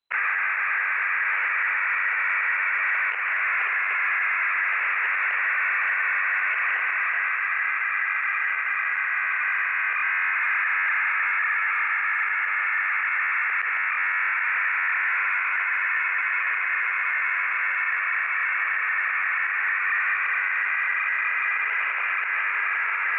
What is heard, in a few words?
Makhovik